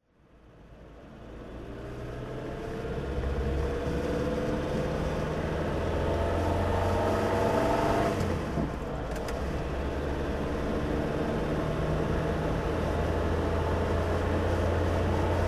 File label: glas 1700gt driving; Glas 1700 GT coupe, 1966; Engine sound; V3D/ ClassicarImages; Marc Vorgers